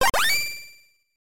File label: door open; 774 deaths